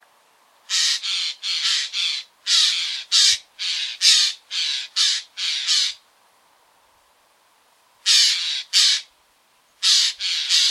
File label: Eurasian Jay Garrulus glandarius; chant des oiseaux des jardins